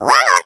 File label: tractor beam; Portal 2; Source Engine; video games; eggbot